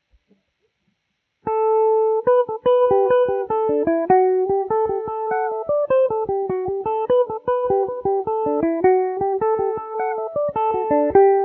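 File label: G major revelation, irish mandolin; henchys delight; Revelation Jaguar electric mandolin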